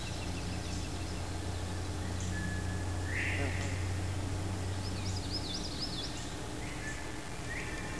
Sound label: wood loop